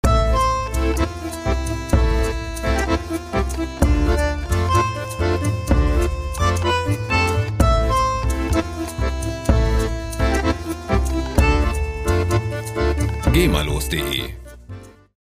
bandoneon dance

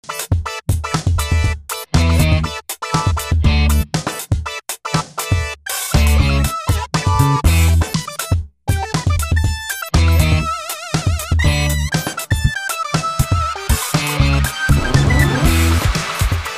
nu space funk

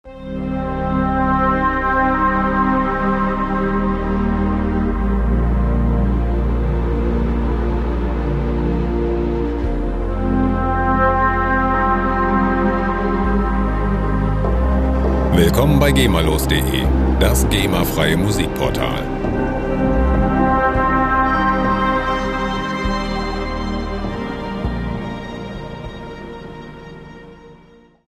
celtic atmosphere

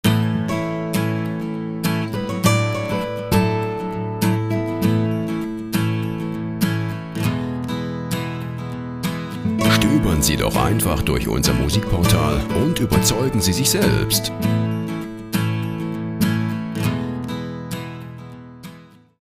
acoustic guitar

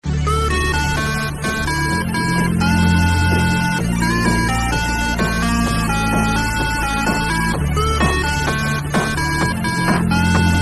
8-bit tusch

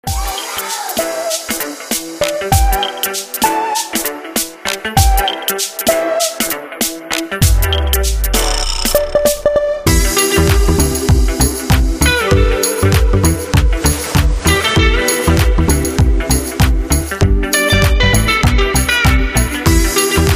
straight house

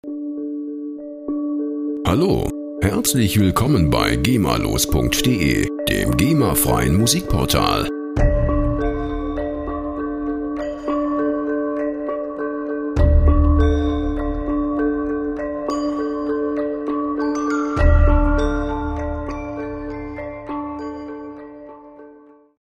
gamelan

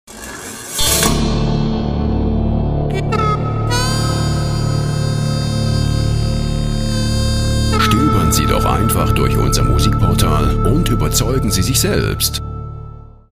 western

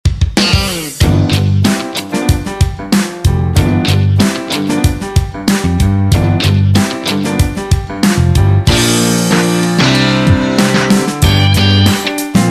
rocknroll

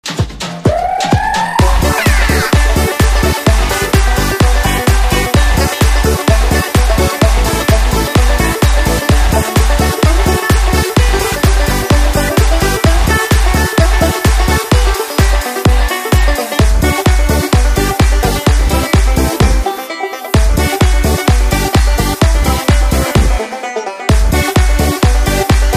banjo jig

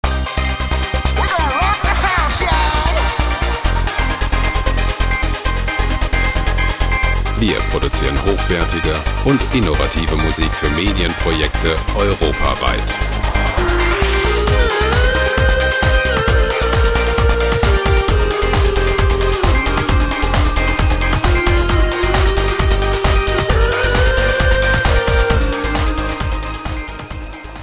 rock house

